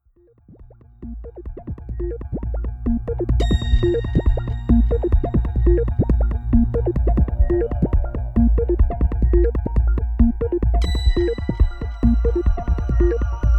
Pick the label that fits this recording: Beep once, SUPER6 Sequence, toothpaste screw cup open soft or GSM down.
SUPER6 Sequence